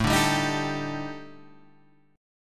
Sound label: Eb Major 7th Flat 5th A, guitar